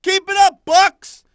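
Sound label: "Bucks", basketball fan; NBA Inside Drive 2004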